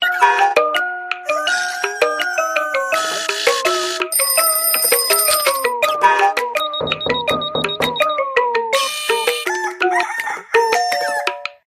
multi alarm; Sony; Xperia Z3

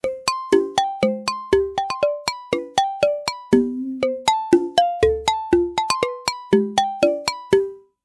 Tumbler, ringtones

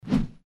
bounce

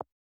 Keypress Delete; China Telecom

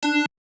dial tone es; Oppo Neo 5

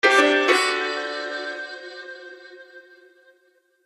Ringtone, BlackBerry 9000 Bold

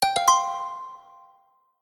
Pizzicato

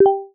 toneplay eos; Bixby

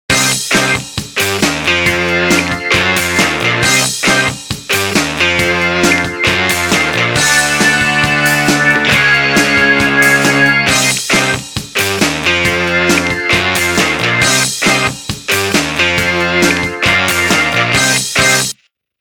Organ morgan, phonetones; Sony Ericsson; W960

